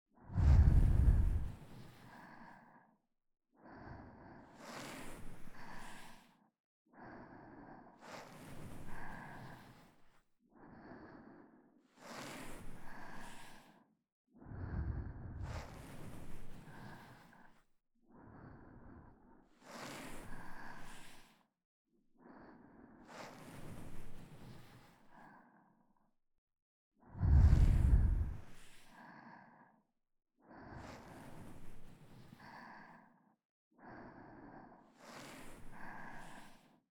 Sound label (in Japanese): 癒し 頭なでなで; 癒しの音 詰め合わせ♪